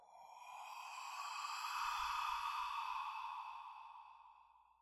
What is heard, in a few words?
whisper; Barotraumatic; Teleglitch paranormal